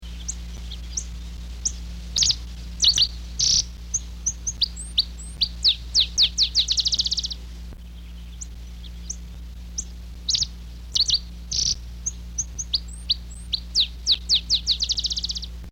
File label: botteris sparrow song